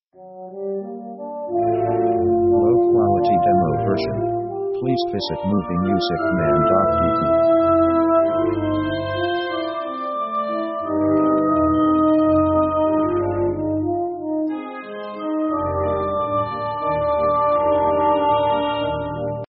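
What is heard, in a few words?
orchestral french provence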